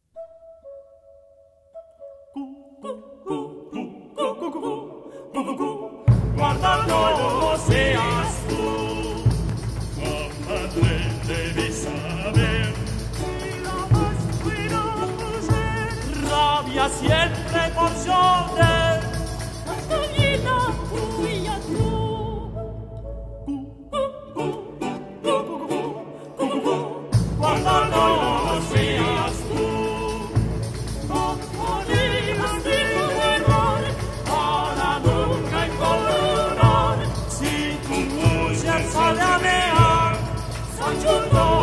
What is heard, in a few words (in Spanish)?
Cucu Cucu Cucucu